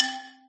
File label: brick combo; candy crush saga online